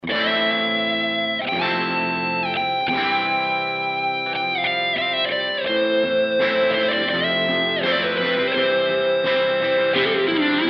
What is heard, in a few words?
guitar